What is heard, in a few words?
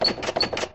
Locked